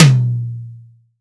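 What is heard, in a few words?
MIX3 HIGH TOM, ROCK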